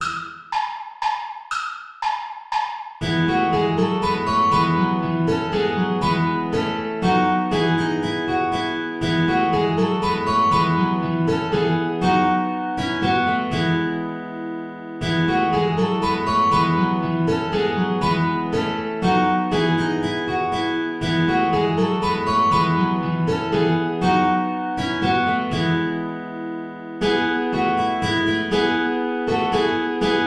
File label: saltarello play along without guitar; praetorius michael